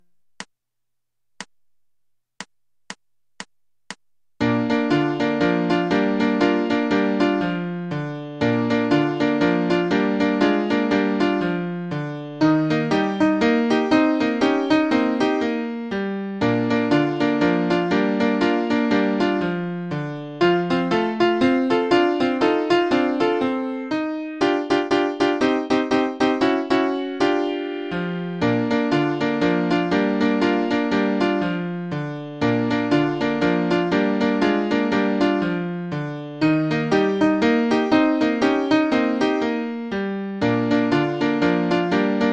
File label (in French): soprano